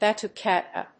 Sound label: Batucada